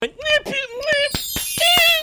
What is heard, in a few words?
magic ungh